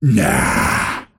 deny pain; pudge

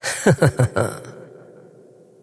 wolf laugh; dota; crystalmaiden

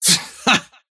deny pain; dota; kez

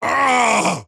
pain; axe

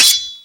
melee hit; weapons; dota; creep

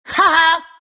laugh; shredder

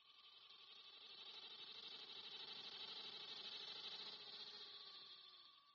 cicada; dota

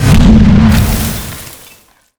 crystal nova; weapons; dota; crystal maiden

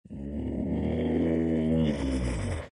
big moans; Undying

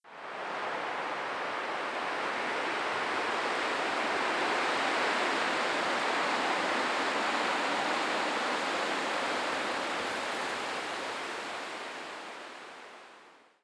breeze; ambient soundscapes; dota

